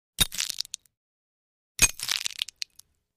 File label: Two Large Flash Bulbs, flash pops